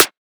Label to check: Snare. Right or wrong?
Right